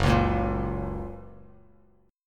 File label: F sharp Major, Bass Standard; strummed; JGuitar